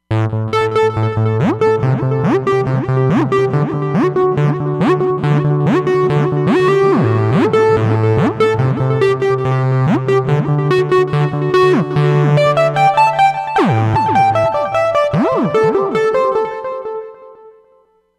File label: Sliced Lead, Roland Fantom XR